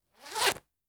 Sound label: Zippler, Clothes